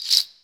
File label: PALM STONES, ETHNIC PER; AKAI S-Series CD-ROM Sound Library VOL-5